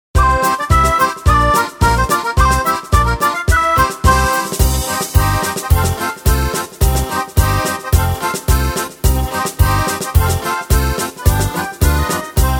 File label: karaoke